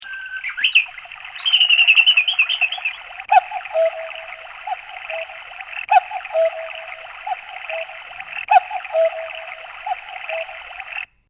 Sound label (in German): kuckuck